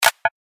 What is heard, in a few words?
Catch Rattle Click